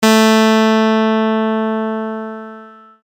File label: clav